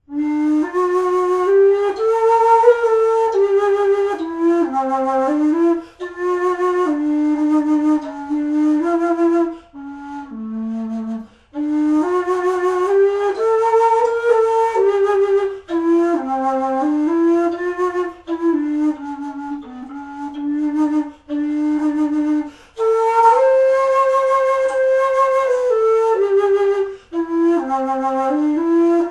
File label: green sleeves bass, recorder; Tategoto